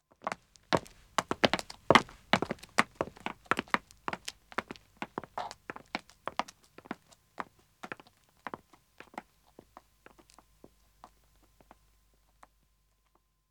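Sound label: Pavement Walking 1 man & 1 woman, Footsteps; BBC Sound Effects Library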